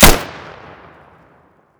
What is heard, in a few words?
fire, weapons; cw m249